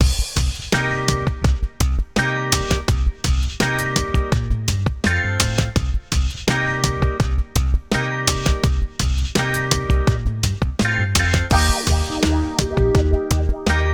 no melody

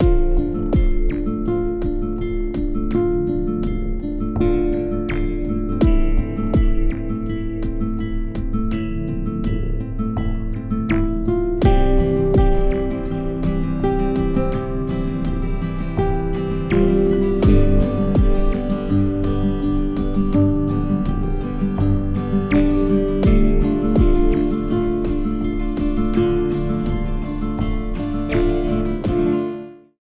no cello

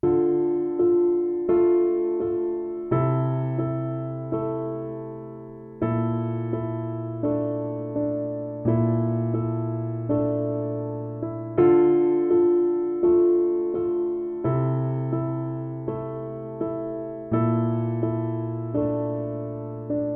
Utter Sadness

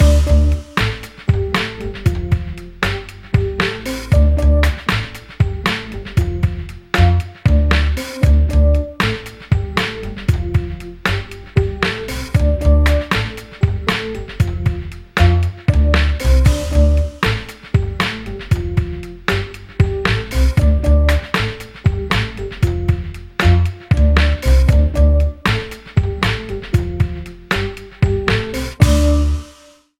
Hip Jazzy Cool

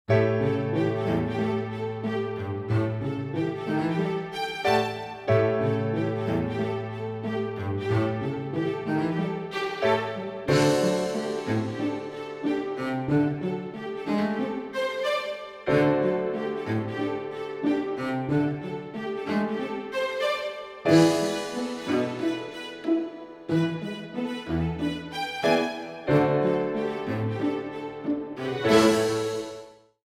Quartet (no drums), string theory

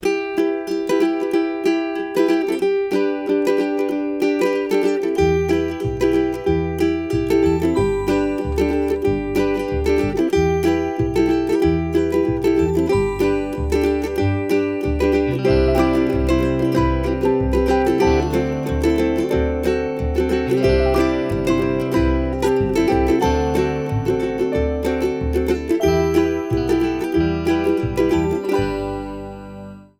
Pleasant Strumming, uke songs

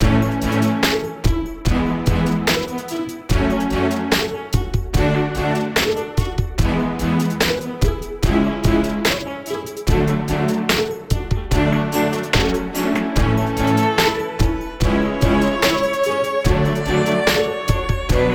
String Song

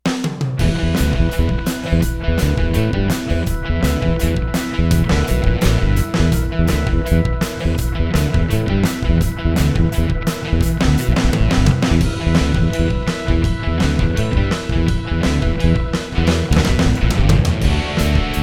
added keys

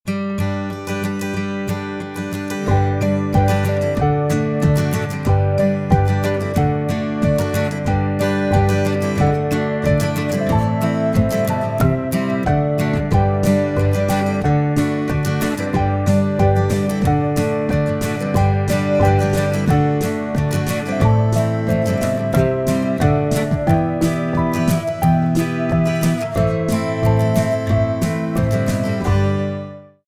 (no melody)

